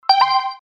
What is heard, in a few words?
notify open